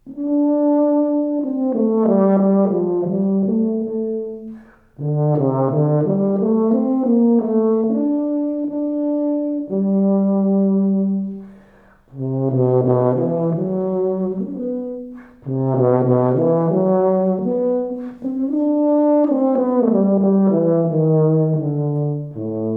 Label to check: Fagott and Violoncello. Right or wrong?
Right